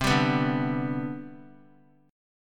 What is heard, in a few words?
C Major 7th Suspended 2nd C down, chord; CM7sus2 strummed; JGuitar